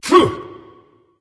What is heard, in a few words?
grunt jump; cerberus